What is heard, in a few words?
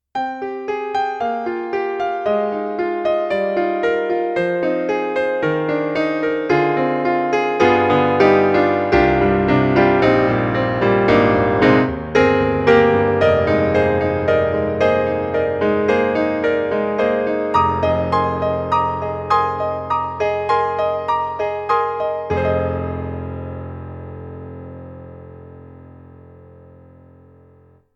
Kurzweil MicroPiano MP-1 Classical Piano, solo tuning (beat-tuned)